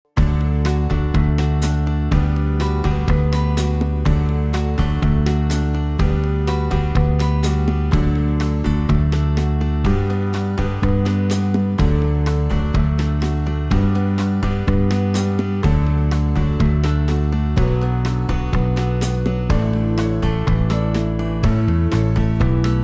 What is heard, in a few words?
D, backing tracks